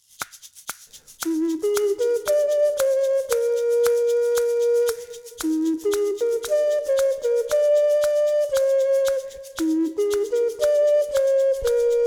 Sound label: ocarina